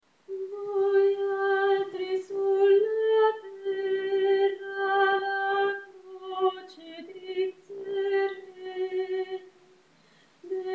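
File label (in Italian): allora sapete contralto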